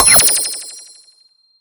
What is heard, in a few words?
UI Menu Magic Item Select; Skylanders Cloud Patrol; Arcade, iOS, Mobile